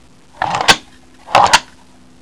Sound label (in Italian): musica elettronica; electronic